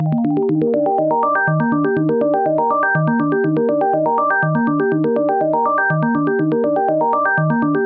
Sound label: 122 BPM Arps A maj G min Tonic D; arp essentials; Aura